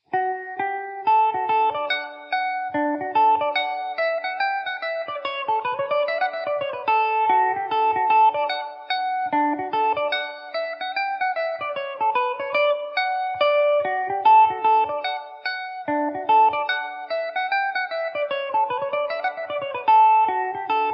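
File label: barndance, D major, Irish mandolin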